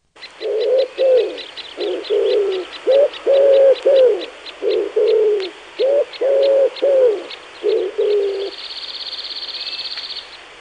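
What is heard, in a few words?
Wood pigeon; Madarak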